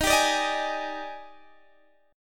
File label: D sharp Major 11th, down, Guitar, Half Step Down